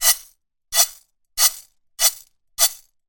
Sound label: food rice dry risotto shake toss in frying pan slow; zapsplat